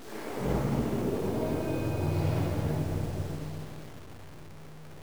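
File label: ambience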